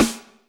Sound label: Snares Electro